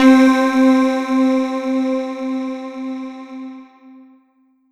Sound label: Roland JV 2080 Brightness C4